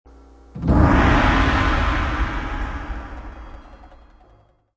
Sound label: Program Error; Matrix2000